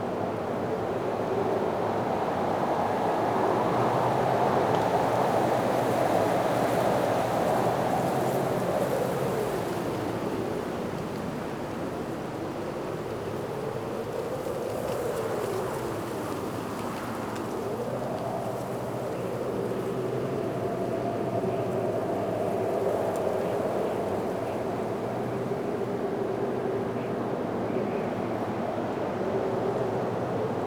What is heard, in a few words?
Cargo Rail Ext Amb; Warframe; Ambience; Corpus; Crp Cargo Rail